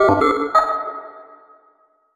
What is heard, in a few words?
Computer Button Press, stinger